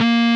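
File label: DISTORTED SYNTH BASS A#3; AKAI S-Series CD-ROM Sound Library VOL-7; STD SYN BASS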